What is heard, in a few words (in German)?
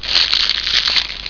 knistern